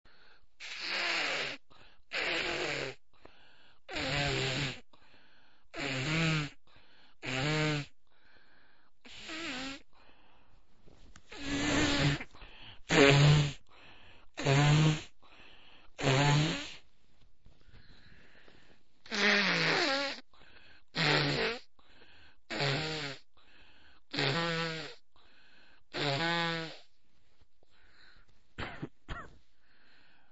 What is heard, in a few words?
nose blow, super sneeze, male